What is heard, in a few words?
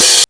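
DRUMTRAKS open hi-hat, Drums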